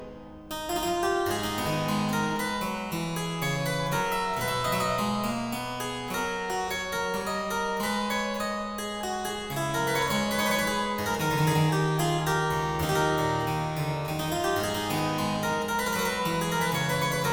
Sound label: Courant simble in A Minor, Harpsichord; BuxWV 245; Variatio 6; Buxtehude; Opera Omnia; Harpsichord Works